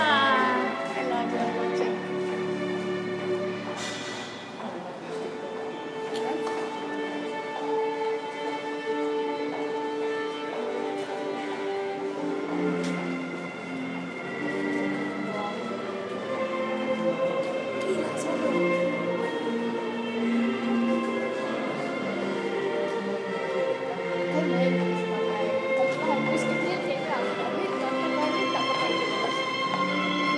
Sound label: impromptu recording of violinist in the tunnel to the train station; estonian babbling